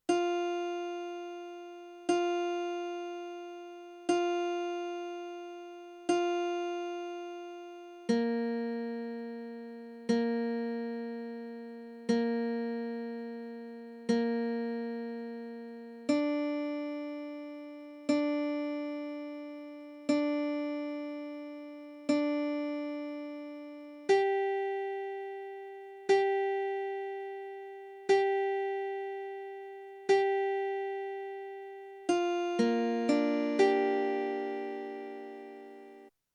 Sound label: tuning notes, ukulele